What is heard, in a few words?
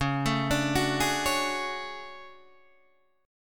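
D Major Sharp 11th D flat, chord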